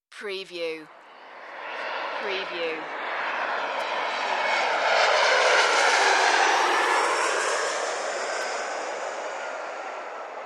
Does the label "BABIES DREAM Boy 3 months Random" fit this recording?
No